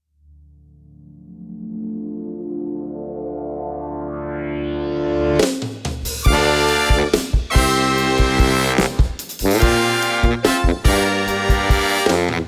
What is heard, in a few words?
Brass Band